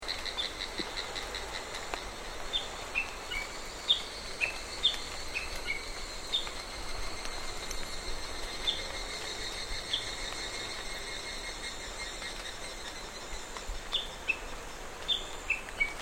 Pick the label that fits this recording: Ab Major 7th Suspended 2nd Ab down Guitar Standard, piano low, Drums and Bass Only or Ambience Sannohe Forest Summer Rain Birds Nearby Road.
Ambience Sannohe Forest Summer Rain Birds Nearby Road